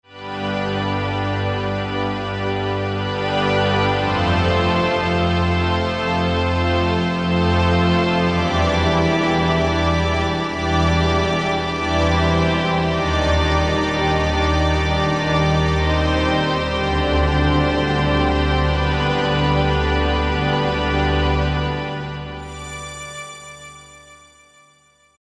Octapad; Sonic Reality Modern Songwriter

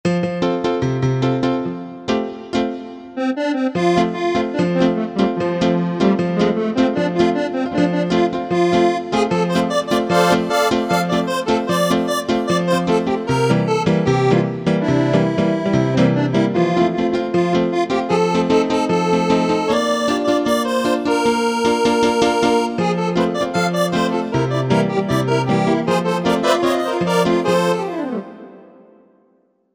Accordion

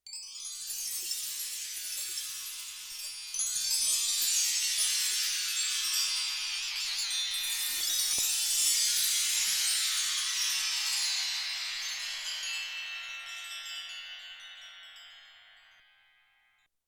Wind Chime Duo C2-C4; Wind Chime & Handbell

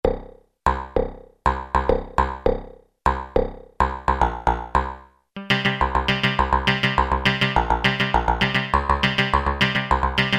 SPIKE BASS; Akai SG01v

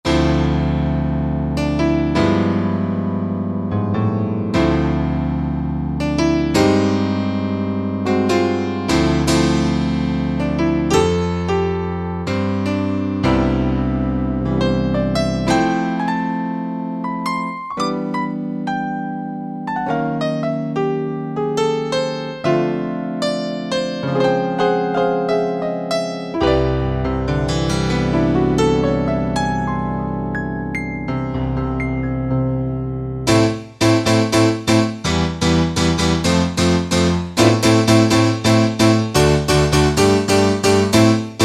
Ac. Piano, Roland JD-990; Factory Patches

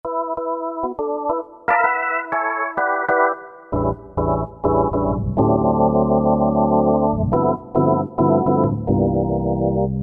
Solo, Korg MSC-9S Organ

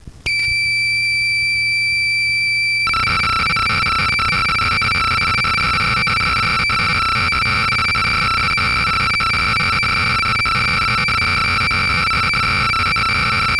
Roland Juno-60 factory programs

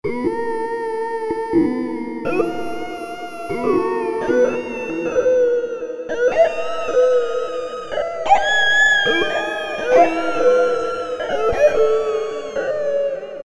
Sfx Howling Dogs, Alesis Micron; Factory Programs

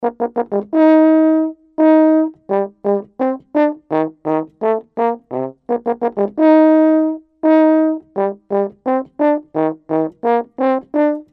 Orchestra Brass; Apple GarageBand 2; Apple Loops

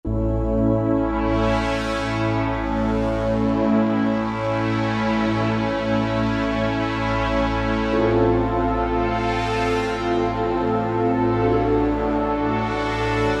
Yamaha TG33

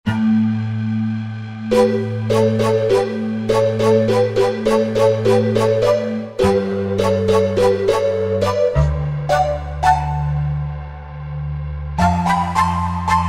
Rain Chiffs, Korg Wavestation A-D

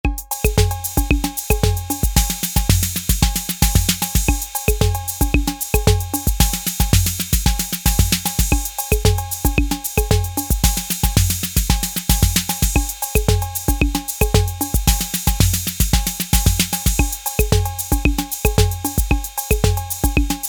SAMBA, Roland TR-808; Factory

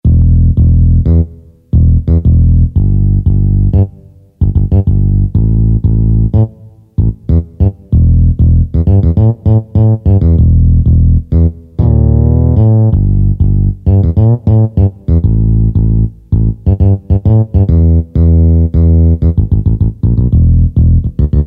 Fngr Bass; Yamaha YPT-400; Voices

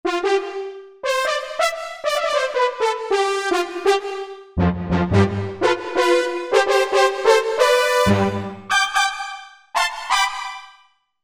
2 voices; Korg MSC-12S Synth 3

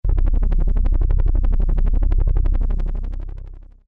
Helicopter, Roland Juno-106; Factory Preset

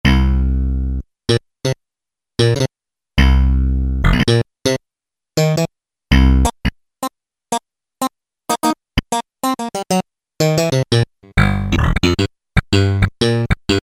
Pull Bass; Factory patches - I banks

